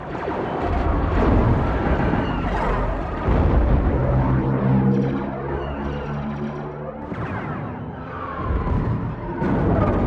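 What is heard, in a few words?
battle tank loop; Prop Gen; Star Wars Clone Wars; XBOX; ambient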